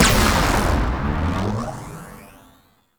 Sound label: Observer Explosion; Warframe; Grineer Ocean; Ocean Sabotage DNA